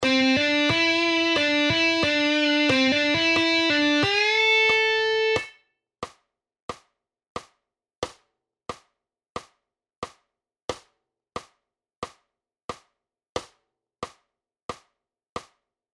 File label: Guitar triplets